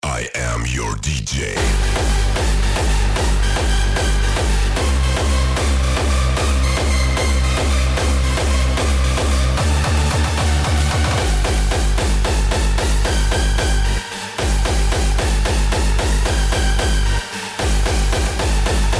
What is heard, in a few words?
Hardstyle; Hardstyle 18